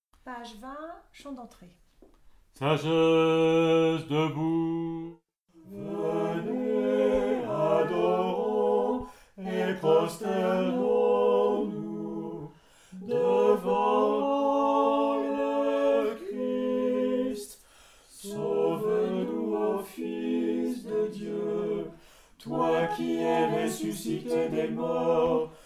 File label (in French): CHANT D'ENTREE